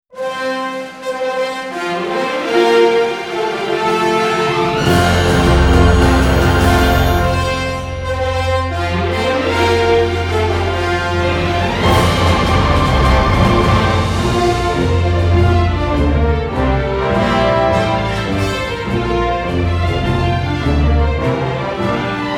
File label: Fanfare; Wednesday Season 1 2022; series soundtrack; Poe Cup